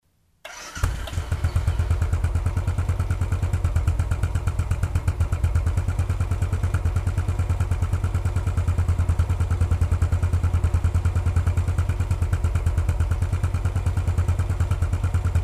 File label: c5 idle, motorcycle